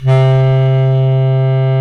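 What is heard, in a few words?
Bass Clarinet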